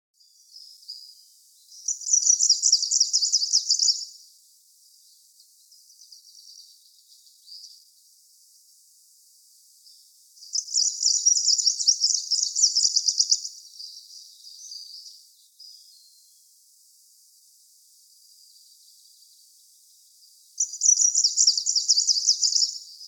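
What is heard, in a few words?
zelenaya penochka golos, greenish warbler voice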